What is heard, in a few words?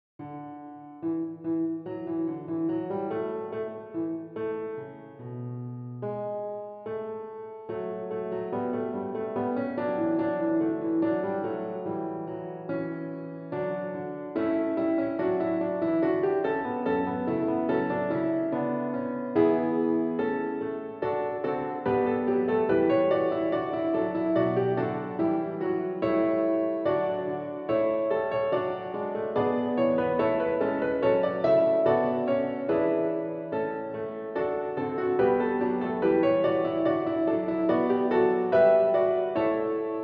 SATB - Accompaniment Track; Sicut locutus est